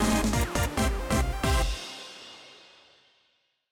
Goal

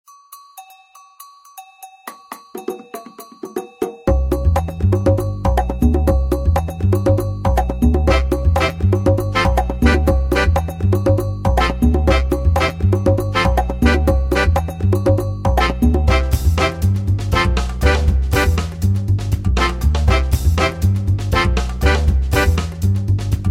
Racing Theme; Duck Life Retro Pack; Android; DL3 Professional Racing Theme

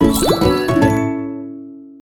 Gem Jingle (Achievement); SpongeBob Krusty Cook-Off; android

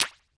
Gem On Gem; gamerip; bejeweled 2 deluxe

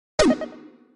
BEEP; Galaga Wars

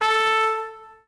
Trumpet tone; game rip; Lego My Style Preschool

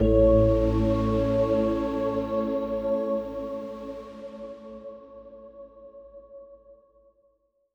Stingers sting positive

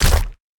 Explosions; Presidente Cthulhu

